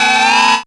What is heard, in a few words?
g#, Rave FX, Dance Synths